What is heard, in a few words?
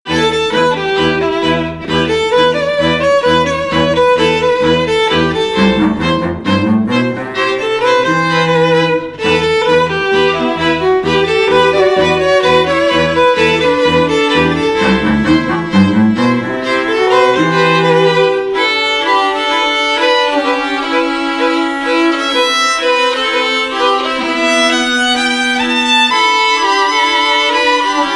Rock Quartet, Classical String Quartet